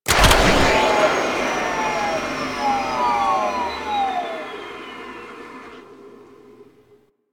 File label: Skiff Engine Boost Medium Stop, Vehicles; Warframe; Grineer Skiff Player Vehicle